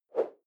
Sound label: whoosh simple short fast